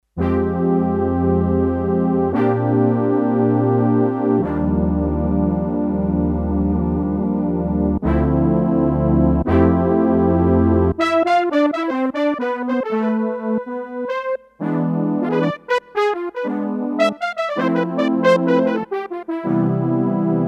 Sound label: Soft Brass, Roland D-10; Factory Patches